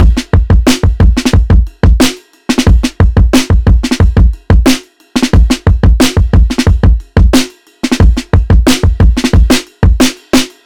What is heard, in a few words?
Ride